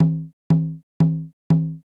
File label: Conga Lo; hydrogen